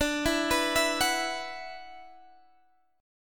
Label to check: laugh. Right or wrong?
Wrong